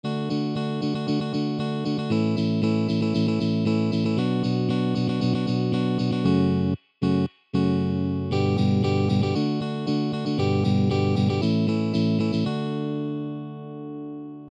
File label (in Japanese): バッキング